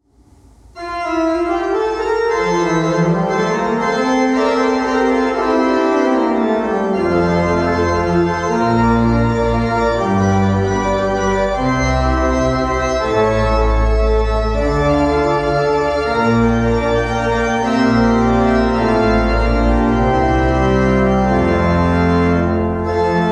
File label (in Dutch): orgel groot